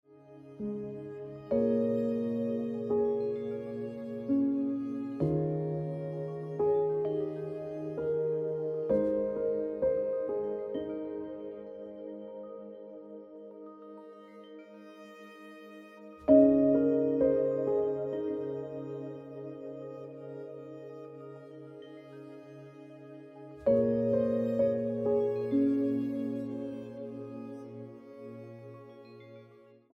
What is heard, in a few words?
Piano Music